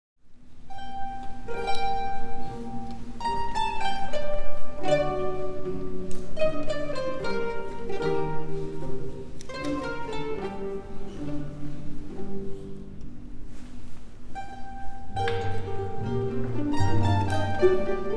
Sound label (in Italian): Sinfonia in Re Maggiore, mandolines; Gioachino COCCHI